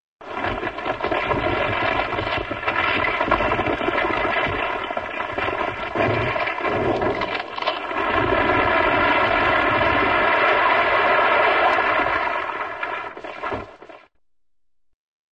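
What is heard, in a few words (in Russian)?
осыпается здание без взрыва; Звуки строительных работ; снос зданий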